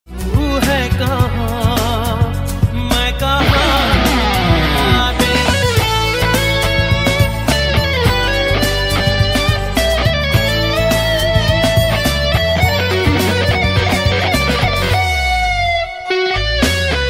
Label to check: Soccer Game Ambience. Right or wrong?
Wrong